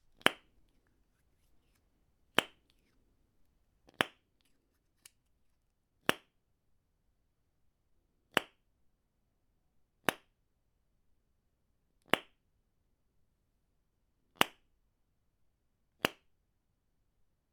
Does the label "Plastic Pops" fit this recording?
Yes